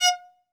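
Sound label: solo violin, strings; ILIO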